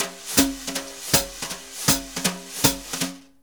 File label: BRUSH, Jazzistic; Univers Sons